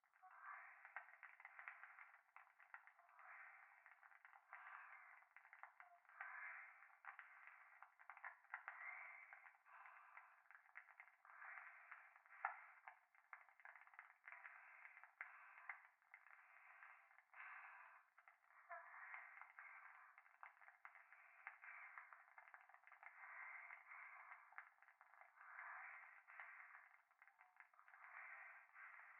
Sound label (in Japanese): 風呂 右耳密着吐息 暖炉